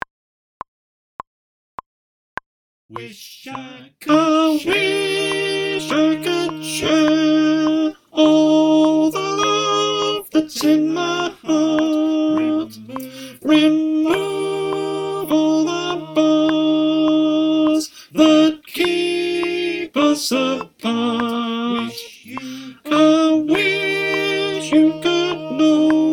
alt clicktrack, choir

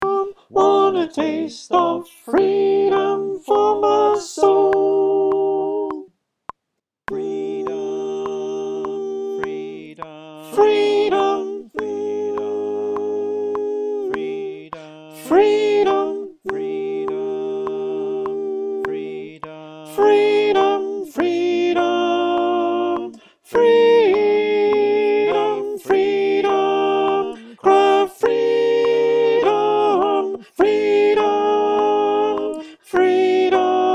Soprano click track

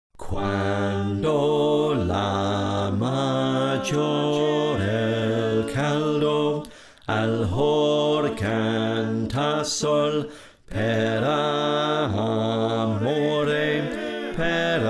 Slow Bass

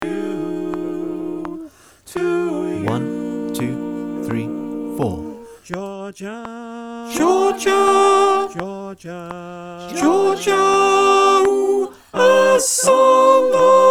Soprano click

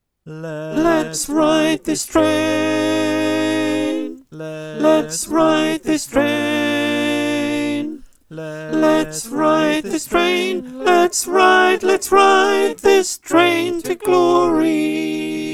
Alto

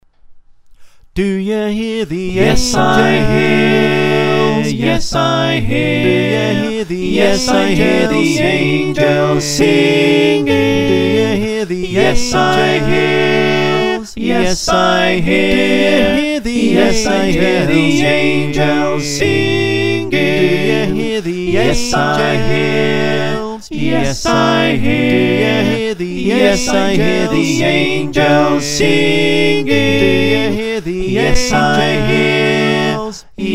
SATB

